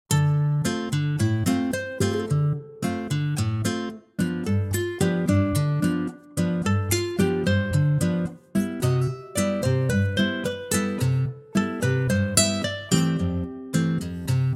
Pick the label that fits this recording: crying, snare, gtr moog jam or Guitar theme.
Guitar theme